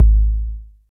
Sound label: kick, Roland R-70, BD